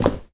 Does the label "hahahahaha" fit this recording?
No